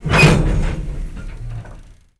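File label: stalk stalker train extra bump; Half Life 2; Episode 1; citadel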